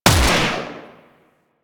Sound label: Shot 3D, Rifle; Warframe; Eidolon; EidolonEliteRifleLancer